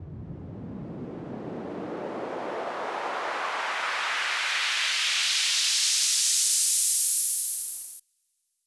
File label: Uplifter; FX; 99Sounds; Monosounds